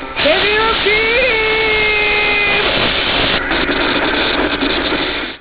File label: Devil Beam